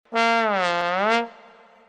trompeta; profesor rossa

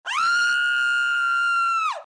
grito de mujer

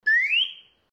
flauta

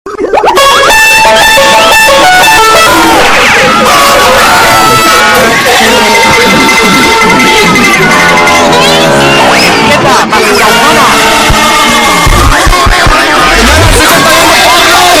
musica relajante

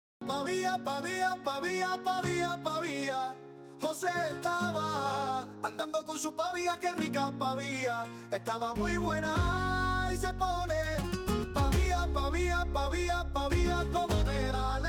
flamenco; pavia

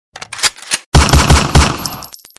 tiro; shadowrun

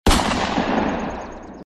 disparo sonido

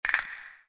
castanuelas

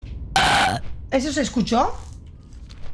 asmr eructo

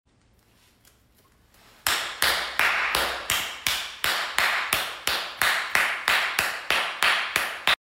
aplaudir sin manos

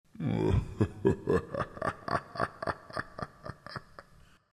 risa diablica

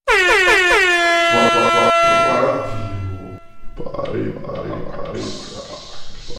bocina radio

